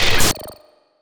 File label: Glitch Sound